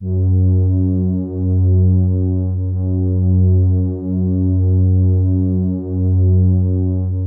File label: warm strings F2, synth strings; Music Store - Claudius Diemeter Schwerlos